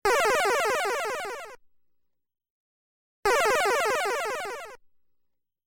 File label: Enemy Magic Sound; Kaijuu Densetsu The Tale of the Shell Monsters